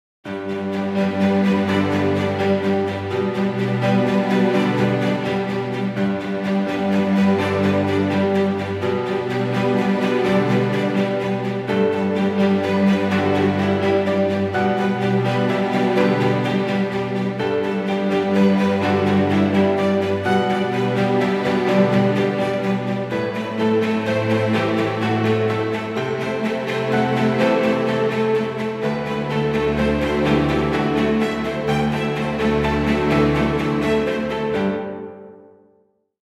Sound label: Romantic Piano